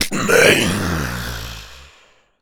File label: Pain, Zombies; zps; Carrier